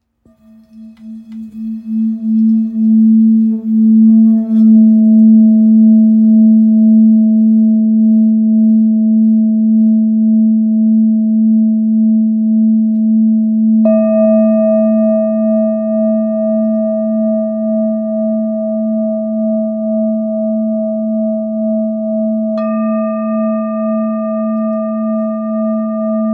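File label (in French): Bol full moon la, bol chantant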